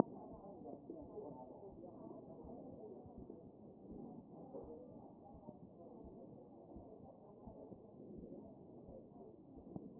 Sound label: chest